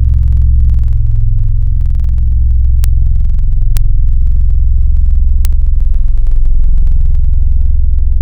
Cracking

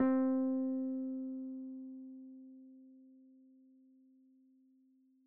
E-Keys

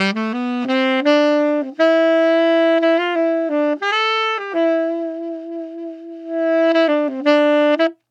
Saxophone 85bpm Am Chill Smooth

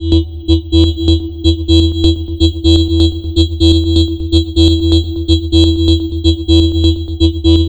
125bpm Synth E

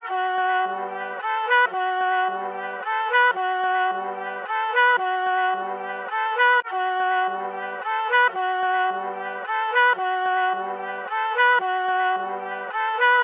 Melody Loop Bmin 145bpm

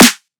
DNB Snare; Doomsday

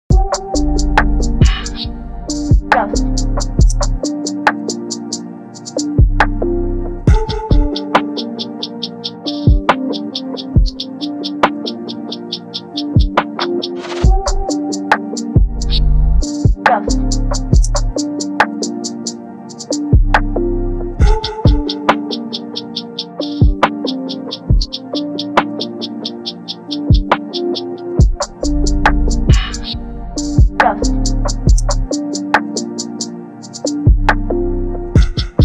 Atmosphere; GHXST

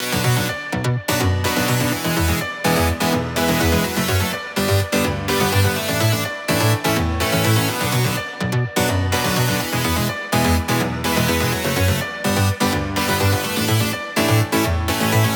Songstarter Wet 125 Bm

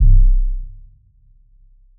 808 Hit; Kryptic Samples

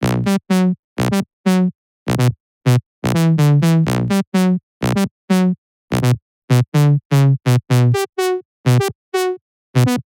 Drop Lead 125 Bm Dry

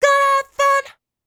109 Fm Hi Harm Fem Voc Dry

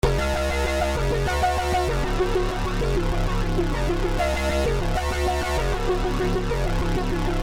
funk me

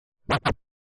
FX Scratch

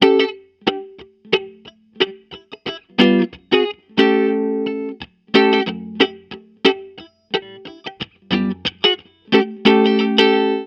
90 A maj Guitar

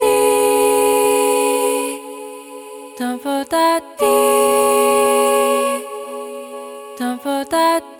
C m Eee Vocal

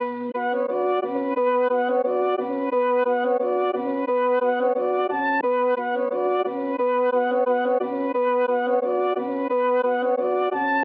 Melody Loop B min 177bpm

